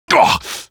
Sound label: rebel pain; svencoop addon; playersounds